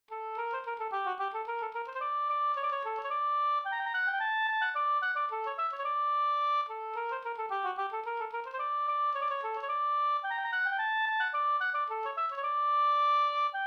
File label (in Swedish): polska Bingsjopolska; e Pekkos Olle e Pekkos Helmer nr2